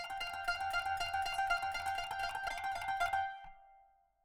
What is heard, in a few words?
kanun